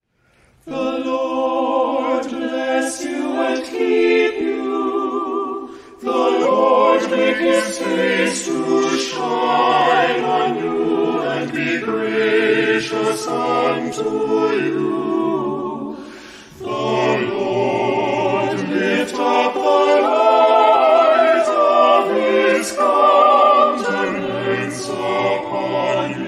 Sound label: Choral; Commissioning